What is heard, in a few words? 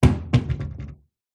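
Cabinet Door Shut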